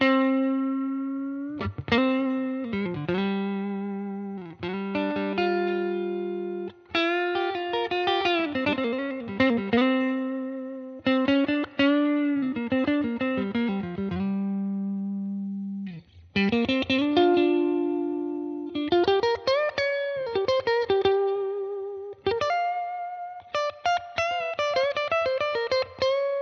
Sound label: Clean Neck NeckMiddle; Muse kit micros HepCat série L configuration câblage Memphis position Manche puis manche+milieu